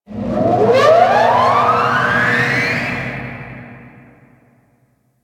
Ocean Turbine Pre Warning; Ambience; Warframe; Grineer Ocean

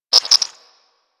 Garden Animal; Warframe; Clan Dojo; Dojo Garden Animals

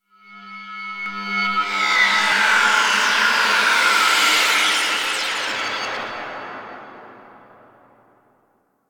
Undercroft Rev Delay Warp Space One Off, Ambience; Warframe; Duviri

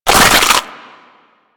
Slingshot Throw; Warframe; Infested; Emissary Ancient Tank

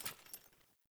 Grunt Foley Movement Armour; Characters; Enemy; Melee Grunt; Sinecure

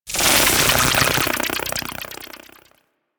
Throwing Sticky Bomb Projectile; Warframe; Tenno

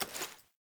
Generic Pickup; Objects; Pickups

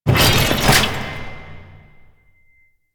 Customization UI Equip; Veilbreaker; Kahl

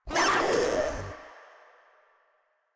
Pain; Warframe; Proto Infested Runner

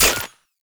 Hack Minigame Turn Off; Entrati

